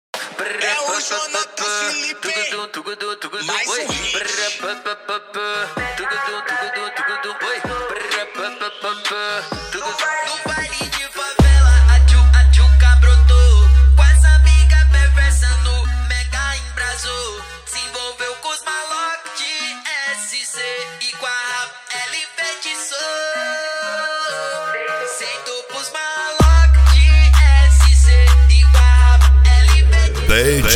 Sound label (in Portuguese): mega funk